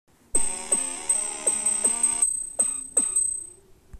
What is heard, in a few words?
robe sound effect